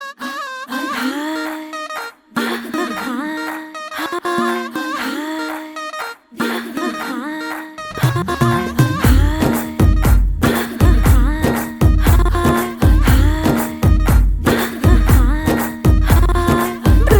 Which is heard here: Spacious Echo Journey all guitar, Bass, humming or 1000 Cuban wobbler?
humming